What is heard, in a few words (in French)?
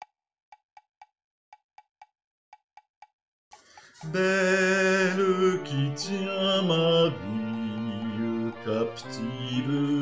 Pavane Basses 1er couplet, fifres